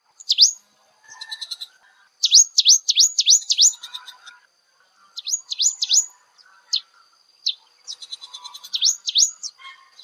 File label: Rufescent Prinia calls, Ipoh Perak Malaysia